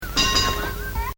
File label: Bells, mono